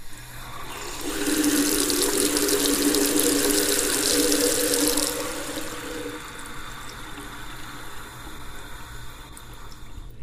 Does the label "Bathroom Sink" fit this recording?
Yes